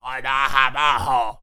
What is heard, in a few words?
Human Evil; little robot sound factory